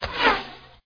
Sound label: Arrow Miss; CastleMiner Z; Xbox 360